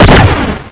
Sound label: pulse rifle fire